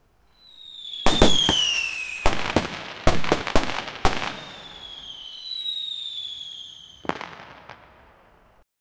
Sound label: fireworks